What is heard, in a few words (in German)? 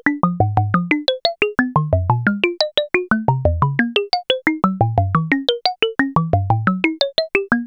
Behringer Syncussion SY-1 E sample and hold Melodie